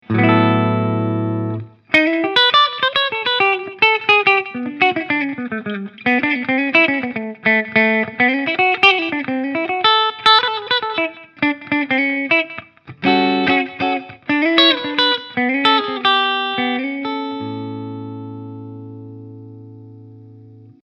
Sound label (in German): Hals Steg clean